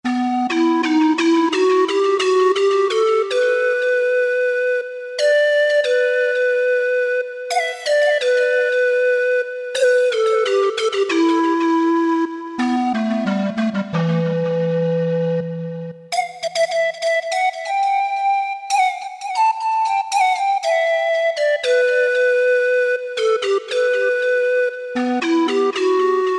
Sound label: Solo PanFl, Generalmusic S2R Performance